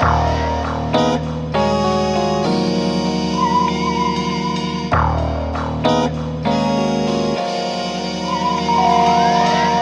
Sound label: keys, phonky loops